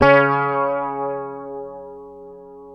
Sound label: Fingered bass C#3 L; Sampleheads; Will Lee Bass Library